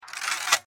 place block; nerf; rampage

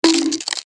egg; cheesy-themepark; dontWhackThePack

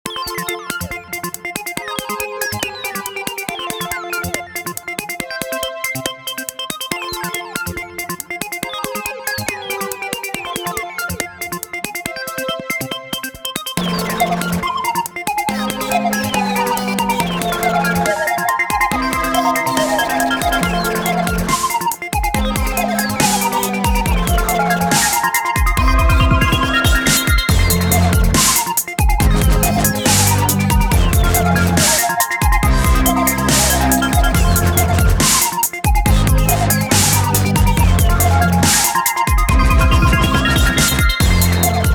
game music

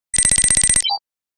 win; nerf; rampage

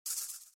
rattle

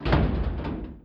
die Zombot; nerf-ultimate-party

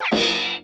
fall; earth-to-echo; downhill

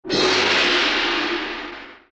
gong; cheesy-themepark; dontWhackThePack

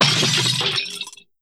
cell phone; cheesy-themepark; dontWhackThePack

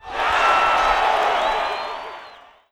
Cheer; cheesy-sports; bigAirBiking

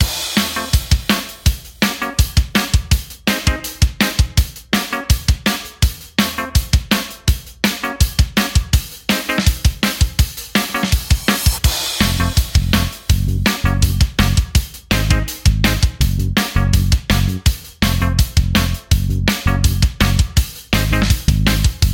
music; cheesy beach adventure; hang Ten

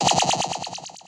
pickup spawn; cheesy-magic-quest; dragonFirefighter

